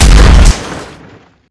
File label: Vehicle Collision